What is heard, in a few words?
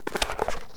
Book Open; SFX Kit; Foley - Misc